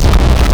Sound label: Player UI, sfx; soma; Five Nights At Akers